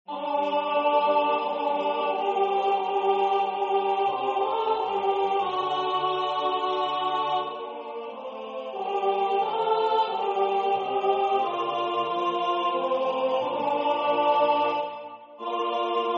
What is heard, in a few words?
Lute book Lullaby Alt; W.Ballet